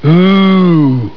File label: ooooh